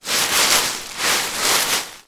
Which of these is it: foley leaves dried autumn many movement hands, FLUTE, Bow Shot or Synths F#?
foley leaves dried autumn many movement hands